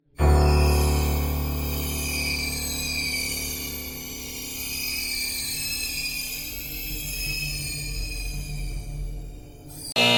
conexion error

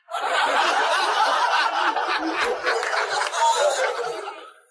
risitas risonas

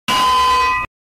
yunque; minecraft

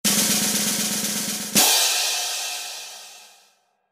redoble de tambor redoble de tambor

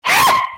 grito

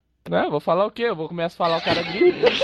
grita

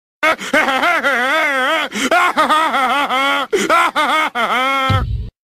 risa

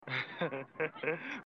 risa; eddie murphy

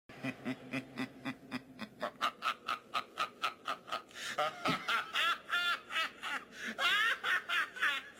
risa

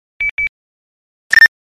alerta beep nextel

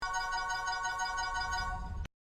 ruleta win

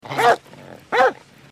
perro ladra

